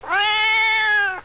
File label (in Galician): GATO